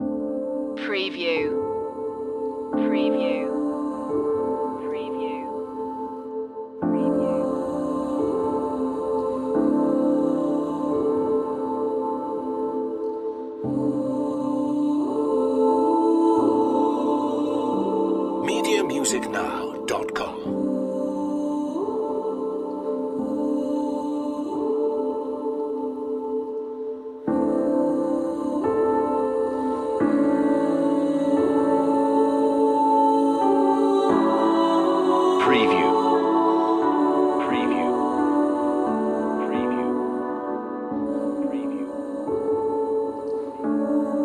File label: female voices piano